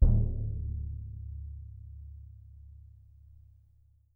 BD P, Percussion; Miroslav Vitous - Percussion & Harp

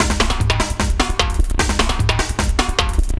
LOOP, 150; Masterbits - Tekkno Trax; D LOOPS

